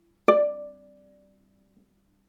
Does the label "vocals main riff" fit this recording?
No